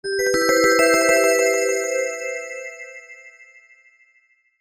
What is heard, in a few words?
Logos Synth Bells Transition Melodic Arpeggio Chord; Ni Sound